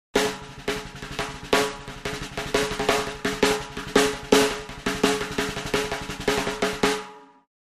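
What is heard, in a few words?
Drums Snare Comedy Accent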